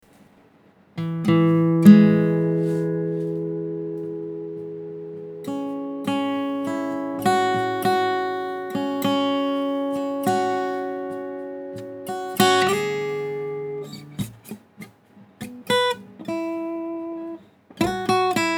C#m100 acoustic

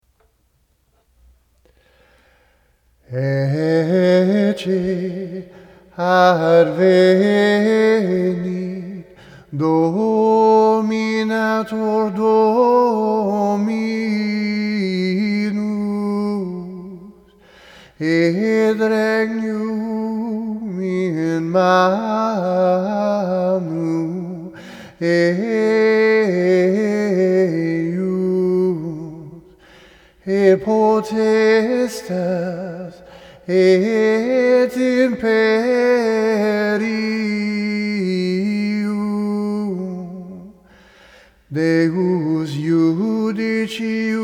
vocal

